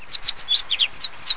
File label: Game Bird; FishingBass